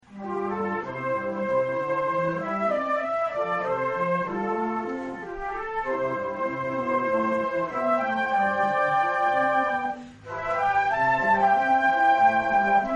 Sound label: concert, flute; AUTUMN concert 2016